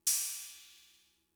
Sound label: Cymbal, Fricke MFB-522, Drum Machines